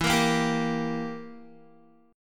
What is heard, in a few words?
E Diminished E, Guitar; Edim strummed; JGuitar